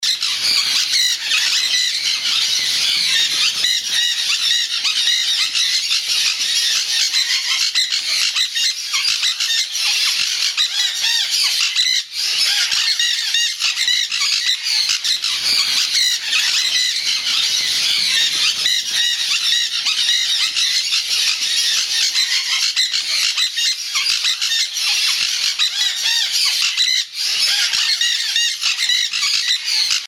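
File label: Sun Conure, parrot calls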